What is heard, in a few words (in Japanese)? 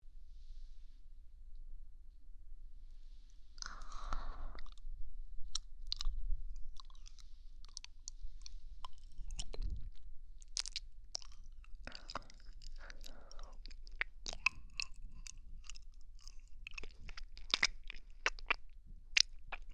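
チョコ舐め（右耳・チョコ舐めのみ）; 2024チョコ舐め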